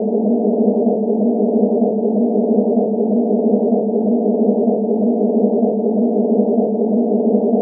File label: Atmospheric Pad